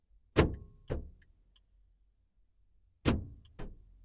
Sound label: Car Central Locking Sound From Inside Unlock And Lock